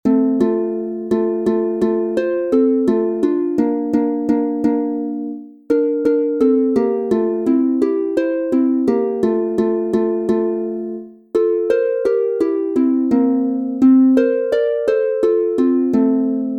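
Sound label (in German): F Dur 2 stimmig instrumental, Harfe; Seemannslieder; Frisch auf ihr Matrosen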